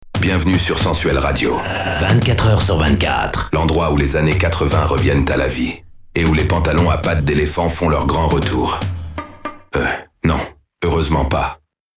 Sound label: jingle; jingle radio pantalon à pates d'éléphants; jingle radio gratuit